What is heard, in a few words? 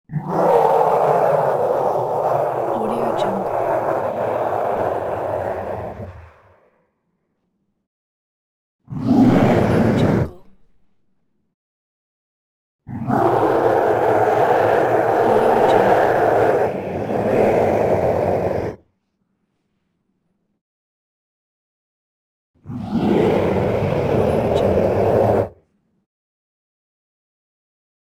demon growl